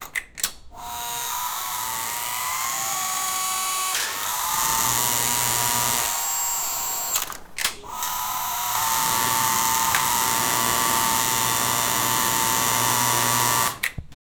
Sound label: manual arc welding